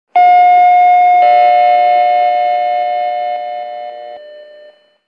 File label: chime; polestar